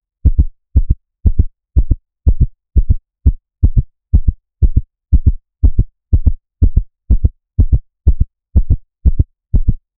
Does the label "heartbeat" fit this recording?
Yes